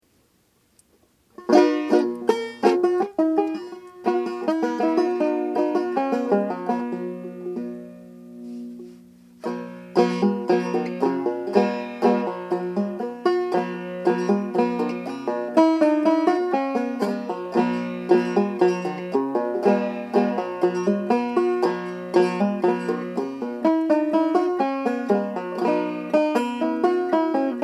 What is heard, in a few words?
cedar rim, banjo